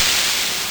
LSDj DR-55, Nintendo Gameboy; 300 Drum Machines

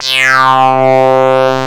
FURZ REZ, TWAV BASS; Soundengine - CSR-000 The Art of Transwaves

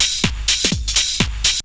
Bite Size Beats

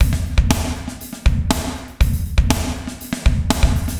120 POWER, drums

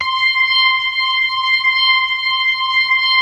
ORGAN 4 DRAWBARS; Keith Emerson Signature Series

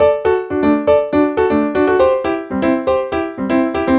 Piano; Dr. Fink's Funk Factory

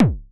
kick, Roland MC-909

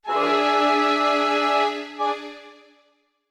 orch sting; musicradar; cartoon caper

